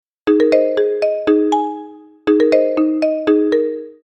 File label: Smartphone ringing modern ringtone single